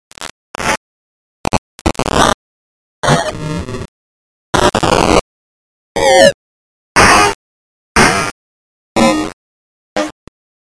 Static Radio Glitches; bottlerocket

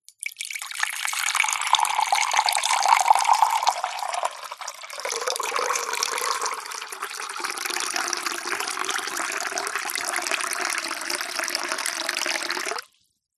household water pour slow into glass jug; zapsplat

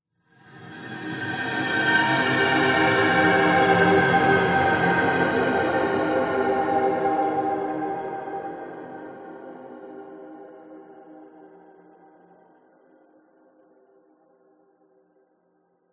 horror swell pass by ghostly drone slight descend

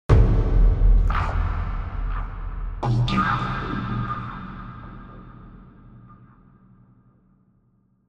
sound design hit cinematic big dark

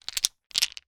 stones pebbles handle; foley; zapsplat

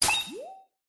science fiction computer activate short burst

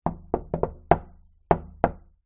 household window knock rhythm single pane

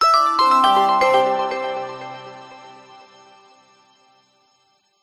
multimedia musical tone goodbye logout; zapsplat

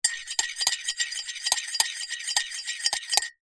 household teaspoon stir empty ceramic cup mug

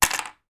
household reading glasses drop on table; sound effects one

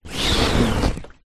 boat rubber dinghy small float side rub on object

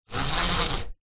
household blind roller pull; zapsplat

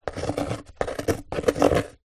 office pen biro draw shape on thin wood

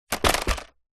leisure toy plastic helmet mask set down carpet

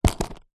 skateboard mini board plastic set down; zapsplat

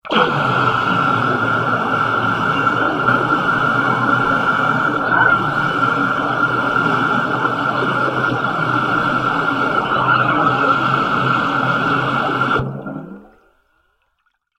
industrial air cannister spray release underwater; zapsplat

